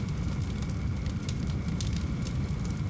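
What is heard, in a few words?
ambience torch loop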